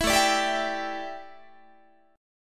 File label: A 7th Suspended 4th, A, down, Guitar